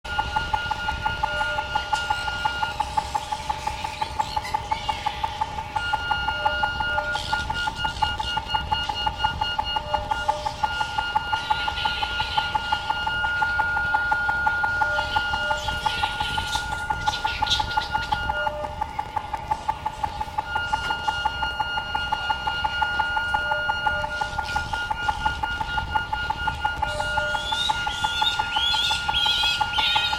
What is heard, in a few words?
Generative Ambient Machine; Wave Farm; diffusion; Disorganised Sound